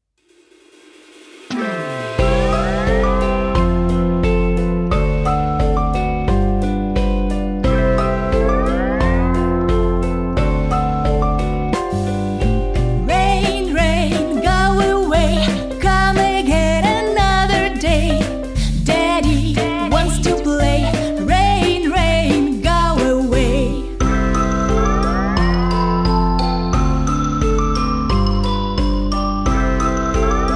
Rain Rain Go Away

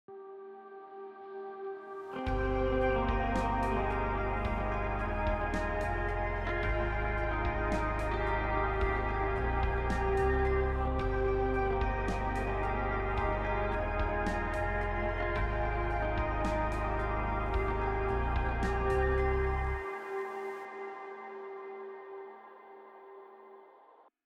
Bb vibe

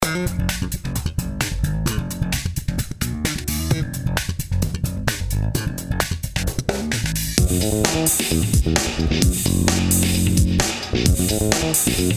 Solo bass

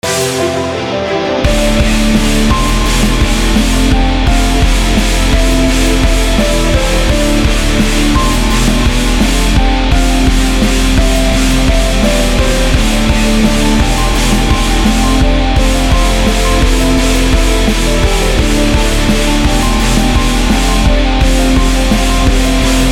type rock

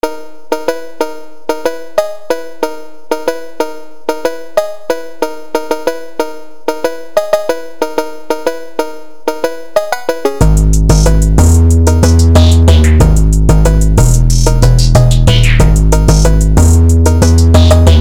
phonk beat

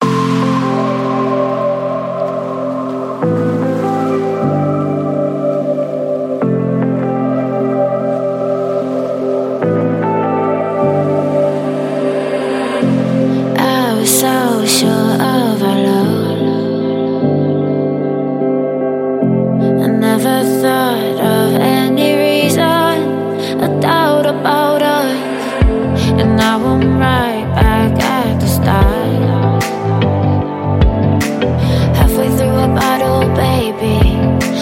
music

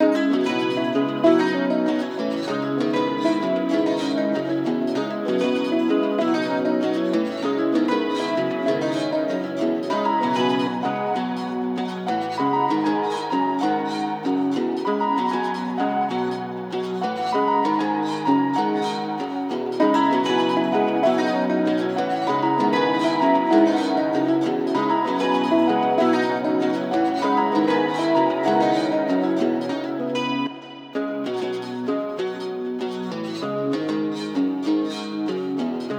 guitar melody loops, Fmaj, 97bpm

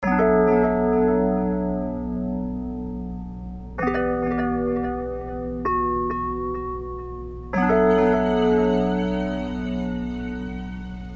sakura vibe

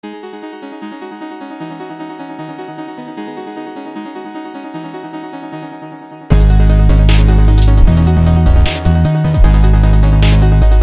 keygen melody

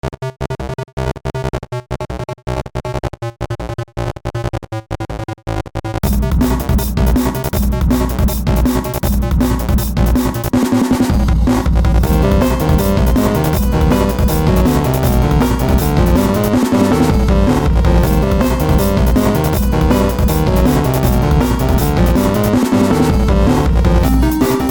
boss fight phase 2

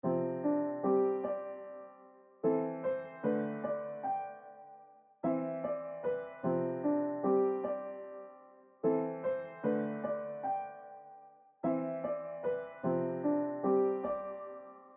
piano sound

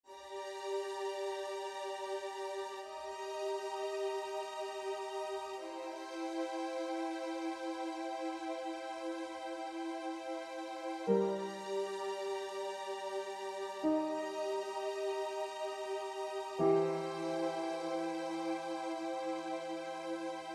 orchestra melancholy

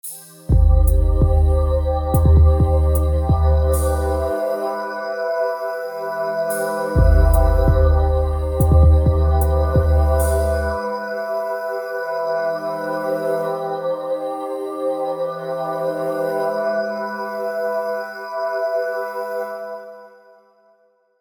panoramic sound 8d

